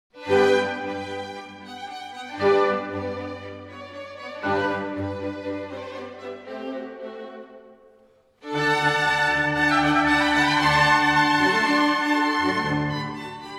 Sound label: Violin Concerto No. 3 in G Major, Allegro; Mozart, Wolfgang Amadeus